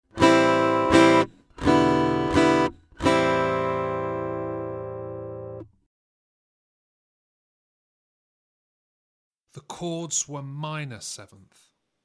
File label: Aural Harmony Test, acoustic guitar; Grade 3